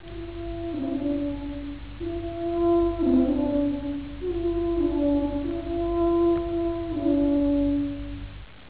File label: trumpet fanfare